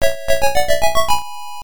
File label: compile fanfare; zanac ex; msx2